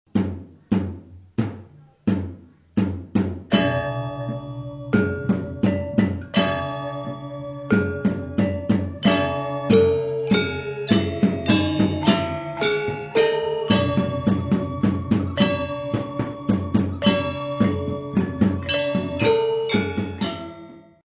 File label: Blow Pluck Hit